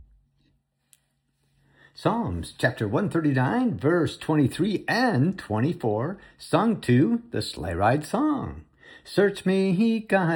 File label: song